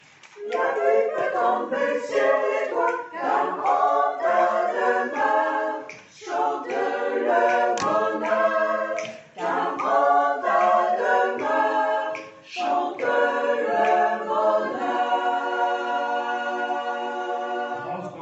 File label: tutti; la pluie